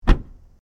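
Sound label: car door close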